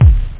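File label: bass drum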